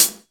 Clear Foot Cymbal A Key, closed high hat sound tuned to the A note; hi-hat